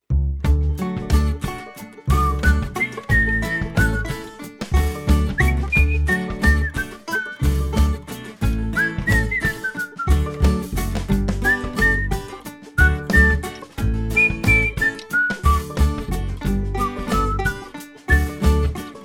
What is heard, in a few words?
song playback